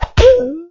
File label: Poke with football